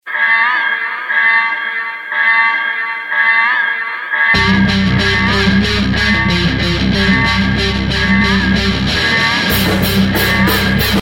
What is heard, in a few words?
resurrected siren